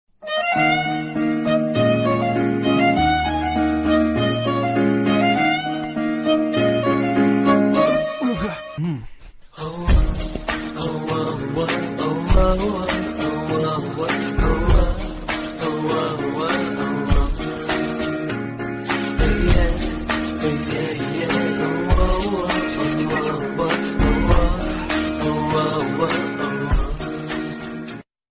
bgm love bgm tamil ringtone bgm ringtone

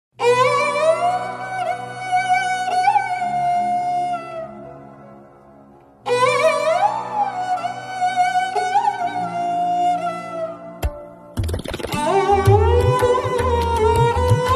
ringtone bgm music ringtone bgm; bombay movie; arvindswamy; manishakoirala

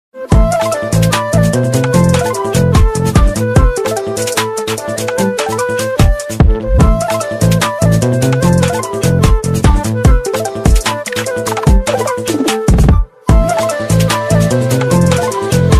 love bgm ringtone, tamil love bgm ringtone; premalu

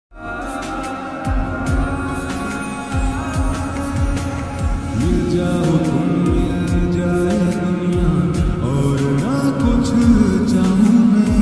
romantic love ringtone

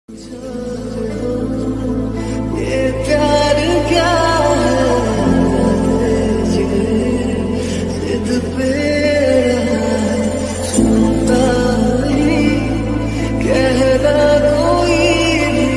hindi sad ringtone, heart broken ringtone

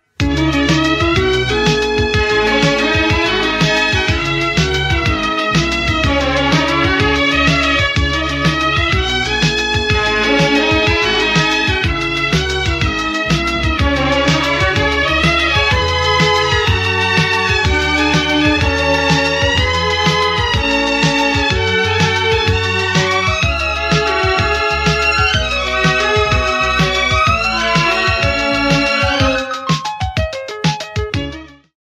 love mashup couple mix tamil; punnagai mannan bgm; evergreen bgm

